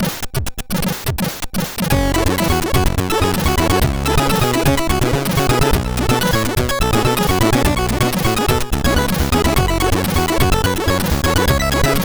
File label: Soaring Funk-o-Matic, CSG8580R5 sid